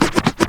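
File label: SCRATCH FILLS; AMG Megabass REMIX! VOL-1 & 2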